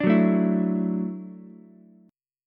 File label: F sharp Minor 7th Sharp 5th F sharp, Ukulele; strummed; JGuitar